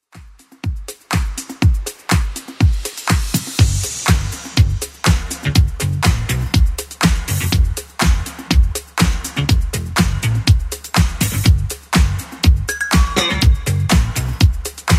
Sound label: 80s Club Edit 122bpm